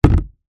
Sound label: industrial plastic bucket down; zapsplat